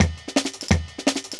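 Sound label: Jungle Breaks, 170 bpm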